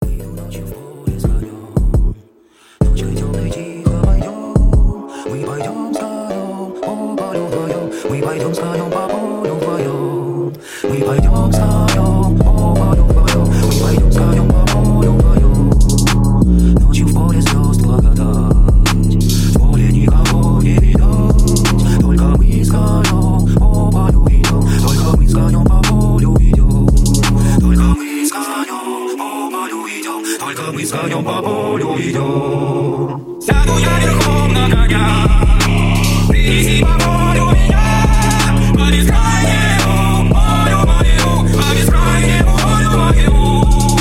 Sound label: phonk